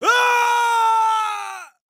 fall scream; mk9 shang tsung

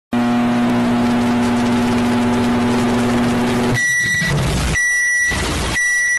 microwave

